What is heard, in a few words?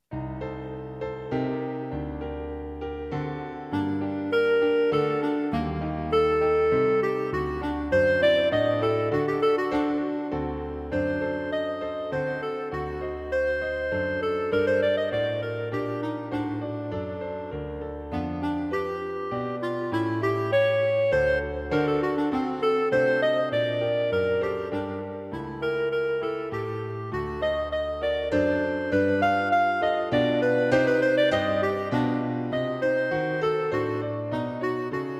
Clarinet, A new gentle piece for solo clarinet with Piano accompaniment; The Piano accompaniment is an important part of the piece.